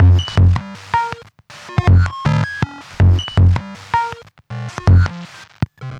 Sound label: Modular Loop, Modular Percussion